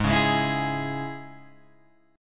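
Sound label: Ab Suspended 4th Sharp 5th chord, Guitar, Open G tuning; down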